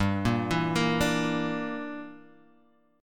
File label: G Minor 6th, Guitar, Half Step Down